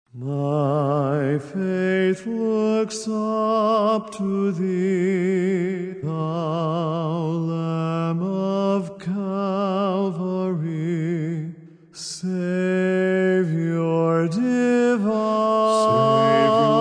Men's Quartet

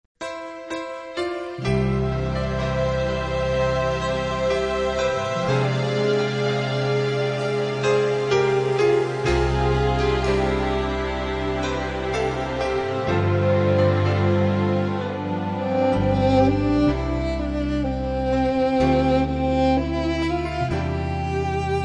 Instrumental Praise

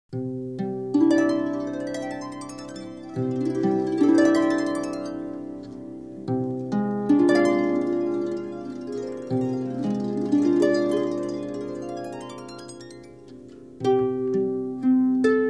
Harp

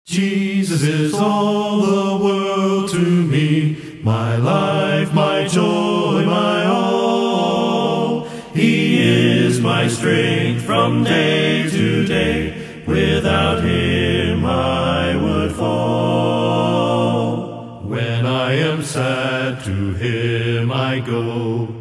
A Cappella

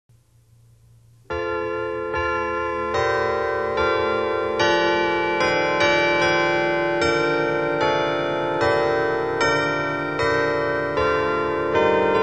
carillon chimes

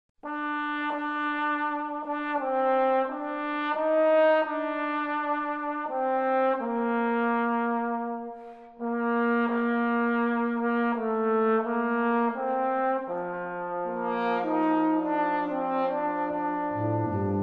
brass ensemble

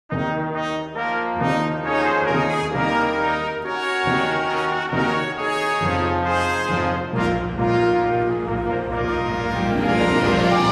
Choir And Orchestra

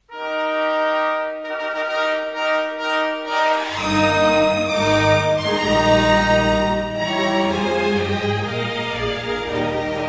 Orchestra and Choir, Hymns

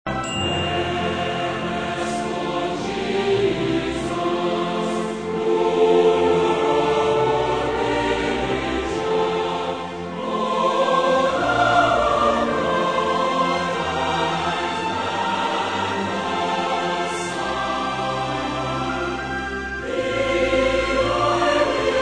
Orchestra and Choir

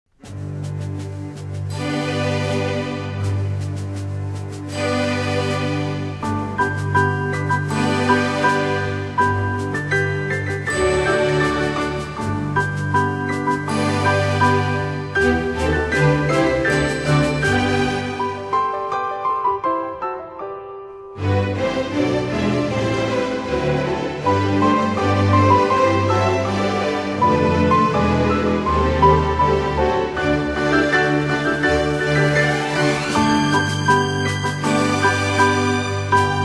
Christmas Meditations for Orchestra

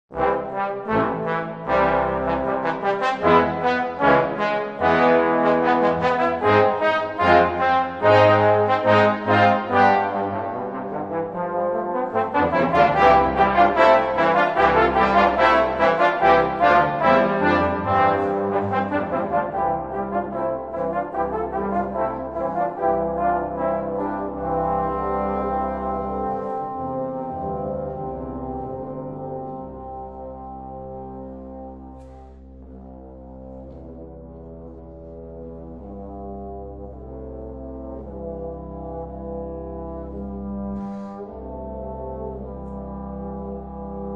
evening prayer, brass ensemble

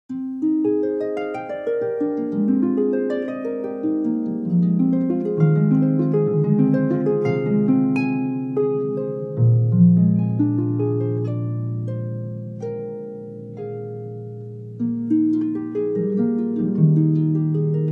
Harp

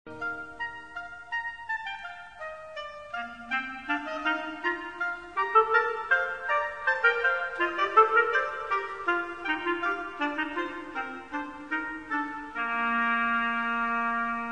Orchestra and Choir, Hymns

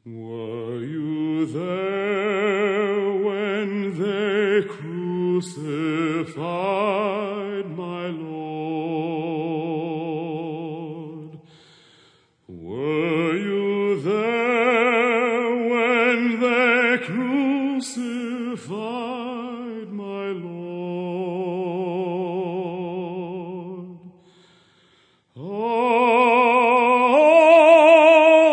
Men's Choir

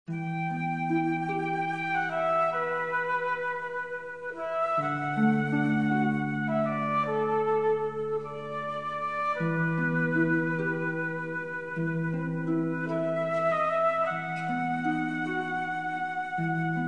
Orchestra and Choir

